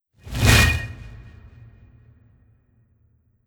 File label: Frozen Blight; Enchant